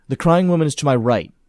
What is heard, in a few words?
crying right; blindside